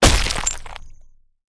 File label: destruction impact hit mixed surface; stone dirt